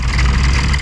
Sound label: earthwrk; Starsiege